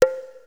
Access Virus B; Synthworx

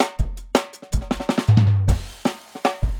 160 live jungle, breaks

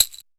CHAXIXI; East West; Global Sounds; RY30 PERCS

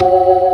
MID FAST, ToneWheel Organ; E-MU Formula 4000 Series Vol. 3; Analog Odyssey

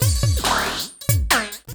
Break Beat, Drum N Bass; Sonic Foundry (Sony Creative Software); Knutrix Drum & Bass

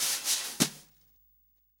snare drum A, SWING SNARE CHROMATIC, REAL DRUMS

